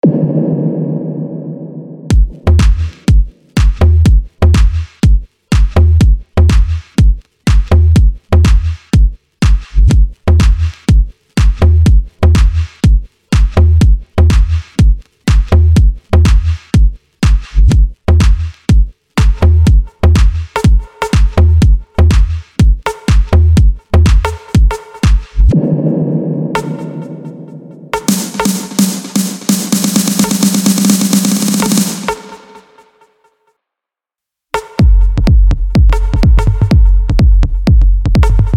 snare

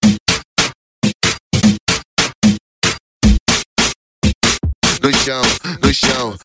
beat

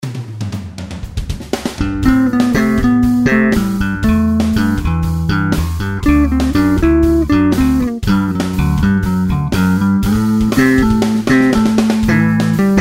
groove bass

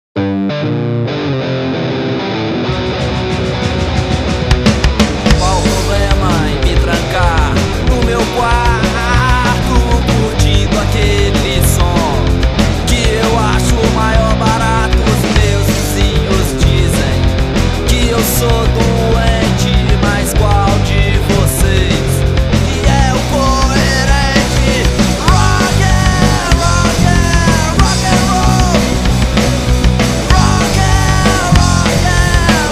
rock n roll

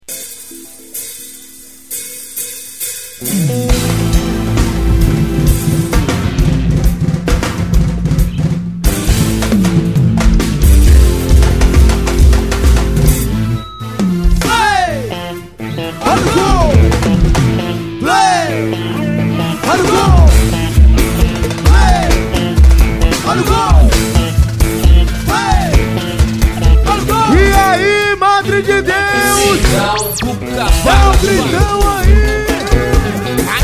instrumental

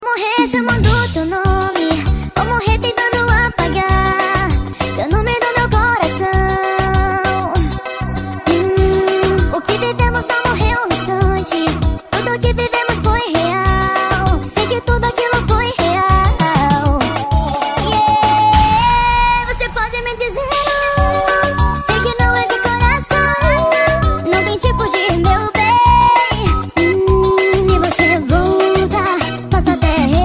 melody

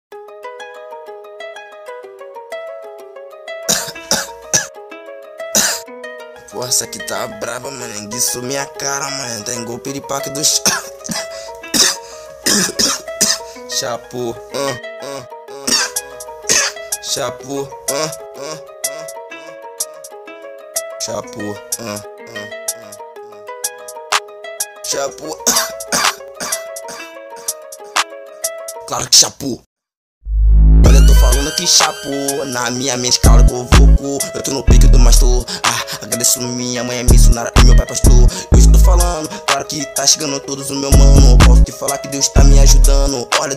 trap beat

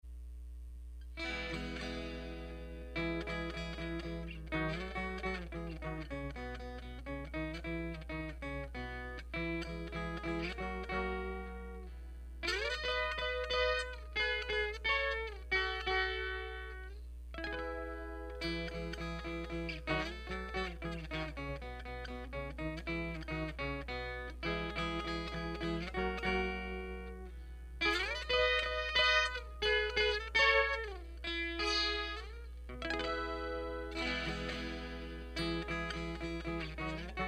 instrumental

